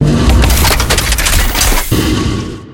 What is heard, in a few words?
start Screen Continue; hiddencoinsofegypt